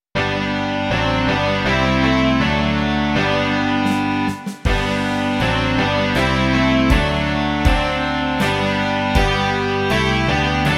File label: rock